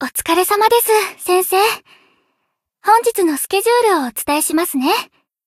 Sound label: applause